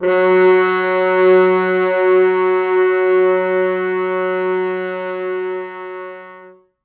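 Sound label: French horns soft mutes, four, F#2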